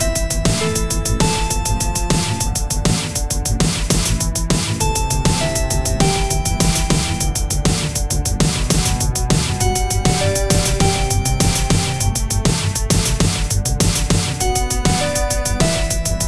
Tense; Draw a Stickman Epic 2

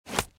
SFX arrow body

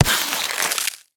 Freezer Gun Hit; Lagoon

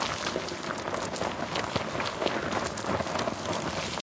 Snow Golem Tremble Loop

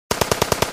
Shoote

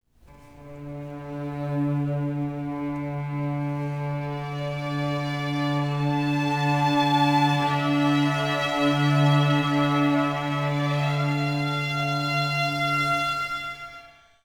Planet Start Ice; Planetary Annihilation

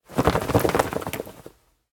flap

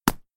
hit land sound; Caravan Beast

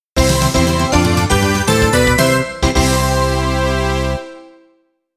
victory jingle; tokimeki memorial 2 substories leaping school festival

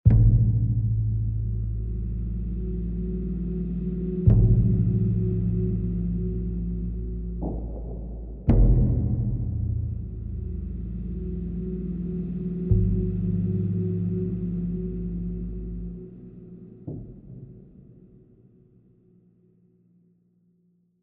Tension

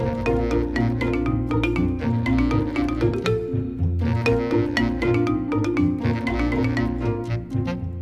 classic xylo; music world

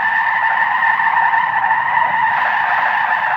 Tiresqueal Mid; nicktoons nitro arcade gamerip 2008

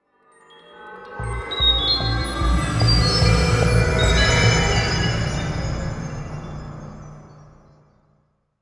74BPM; ForestRepairTechComplete

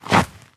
foot scrape

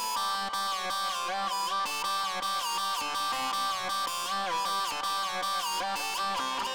Music Transition Synth; Plants vs. Zombies Battle for Neighborville; GnomeGnight

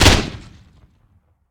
explosion grenade

